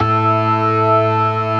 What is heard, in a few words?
STOPS, Synth and Organ